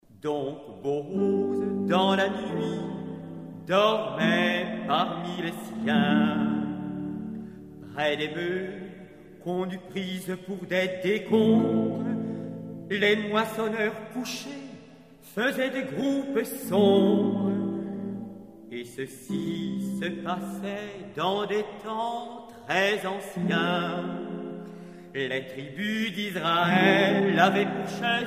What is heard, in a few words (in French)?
avec harpe; Booz endormi (extrait avec harpe)